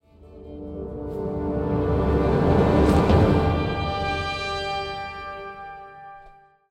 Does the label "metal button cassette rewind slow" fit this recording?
No